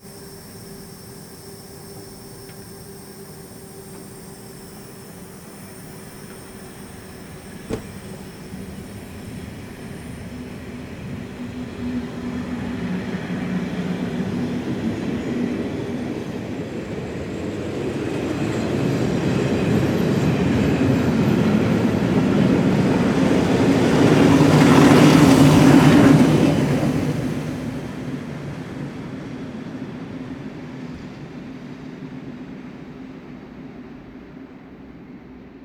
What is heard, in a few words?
train passing; best service Blue Box